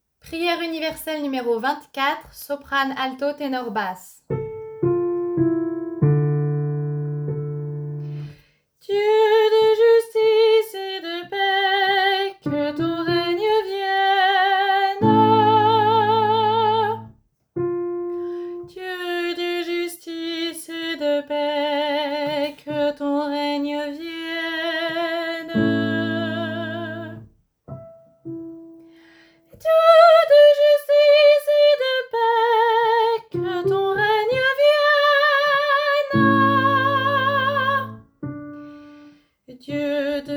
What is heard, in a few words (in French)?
Prière universelle SATB